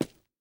rails, walking; Factorio

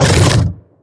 biter roar big, creatures

